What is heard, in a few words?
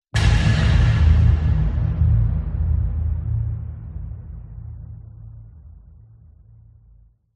cinematic boom impact